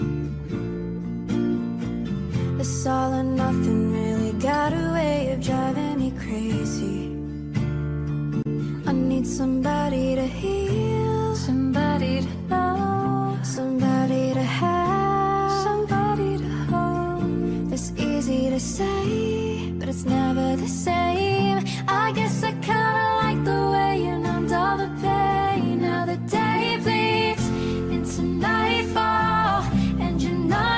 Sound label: Tik Tok sad ringtone